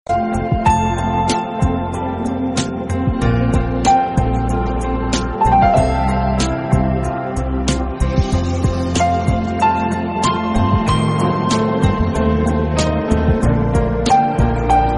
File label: hindi Ringtone